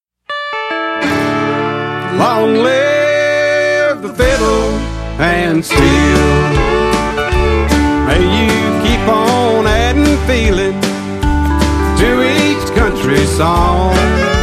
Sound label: Fiddle and Steel